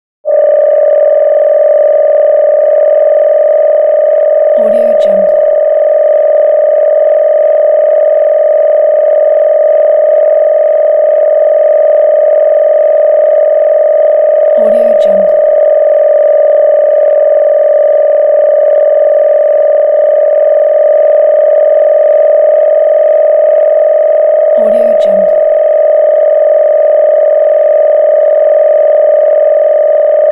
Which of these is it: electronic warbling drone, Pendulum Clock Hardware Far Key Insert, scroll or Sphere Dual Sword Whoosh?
electronic warbling drone